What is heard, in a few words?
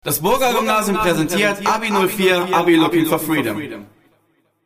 Vocals